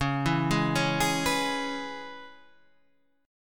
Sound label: C sharp Minor 7th C sharp, chord